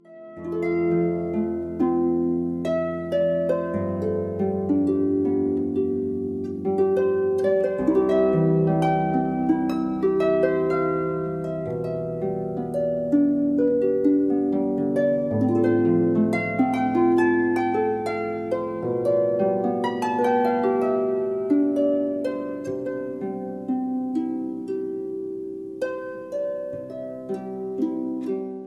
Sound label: Harp